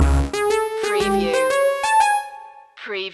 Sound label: retro video game ferris wheel stinger